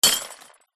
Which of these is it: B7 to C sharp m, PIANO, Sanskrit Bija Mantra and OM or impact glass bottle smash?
impact glass bottle smash